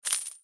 Menu Purchase; Naughty Bear: Panic in Paradise; ps3; xbox 360

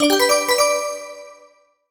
Bell Voice Positive; MUSIC EFFECT; startide

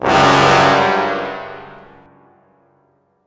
String Medium Brass

